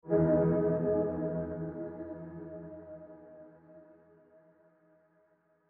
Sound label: menu enterance